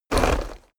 horse step saddle

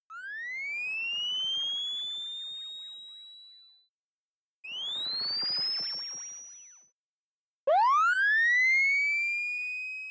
charge

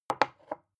glass put down tipping

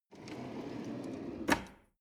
drawer medium close draw

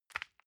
envelope grab soft

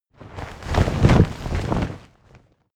flag large flap

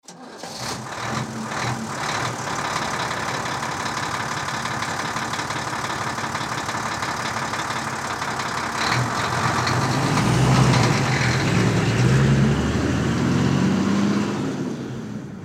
diesel truck start leave

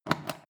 foot switch turn on off quick

